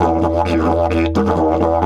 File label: E 130, Didgeridoo